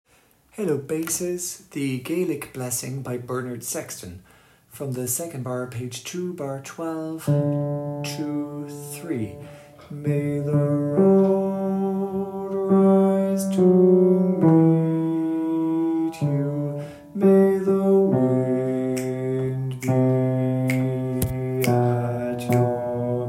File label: bass